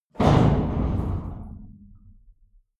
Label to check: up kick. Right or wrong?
Wrong